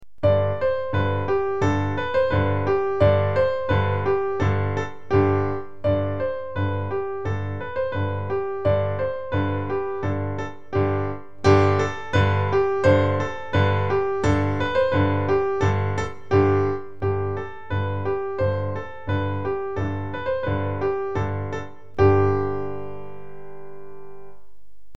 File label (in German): Dudelsack